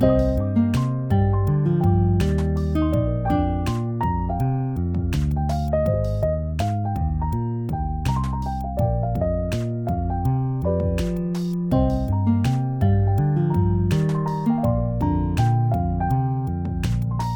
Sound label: Distant Duet Loop; Cutscene